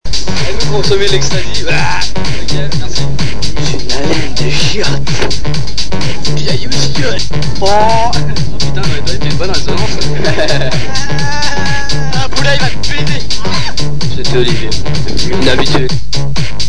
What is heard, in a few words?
fiesta